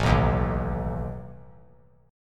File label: A Suspended 2nd Flat 5th A down; Asus2b5 strummed